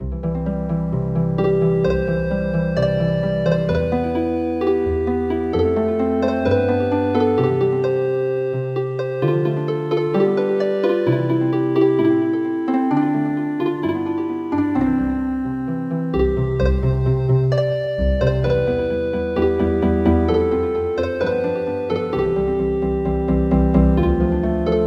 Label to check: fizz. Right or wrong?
Wrong